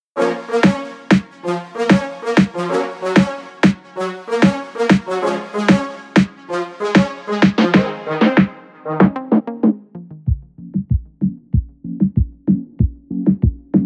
reggaeton

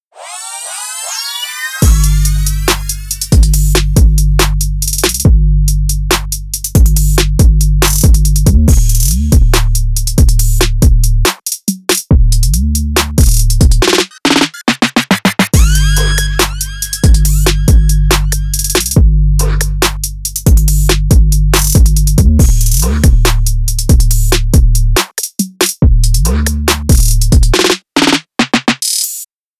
trap beat

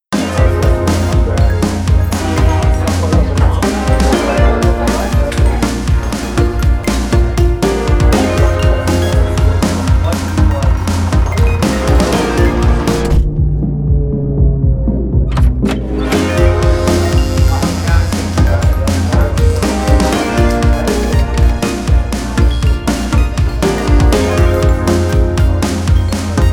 experimental

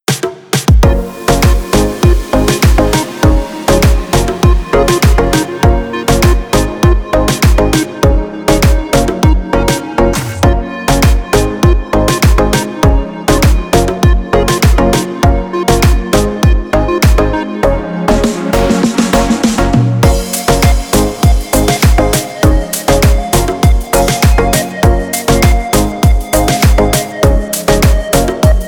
pop house

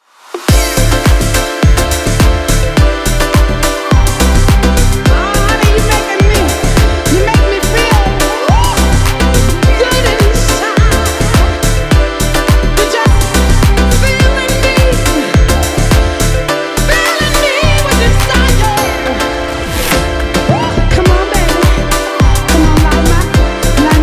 classic house

